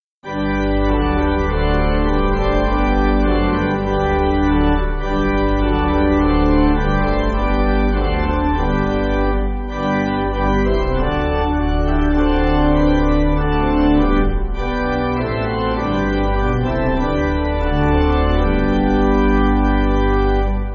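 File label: Pipe Organ, church backing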